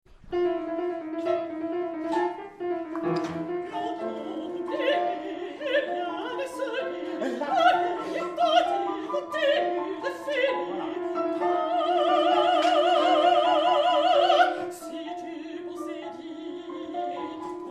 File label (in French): la vieille edentee mezzo